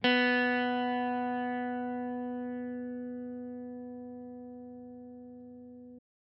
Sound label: fifth pluck